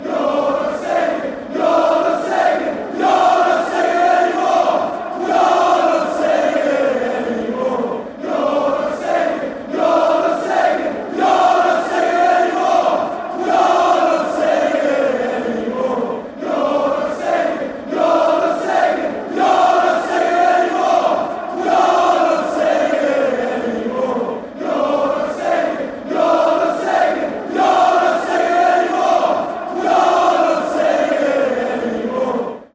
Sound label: your not singing anymore, fanchants